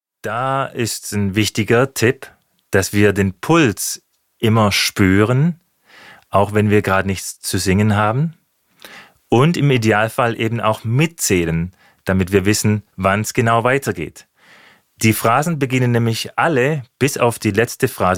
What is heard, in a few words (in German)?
Rhythmus